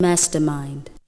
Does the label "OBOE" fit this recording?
No